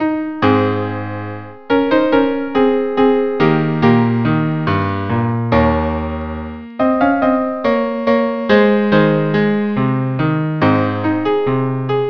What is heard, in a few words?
nocturne; Rolla